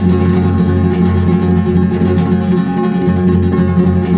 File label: G M, Guitars; Spectrasonics; Hans Zimmer Guitars VOL-2; FLAM TREM CH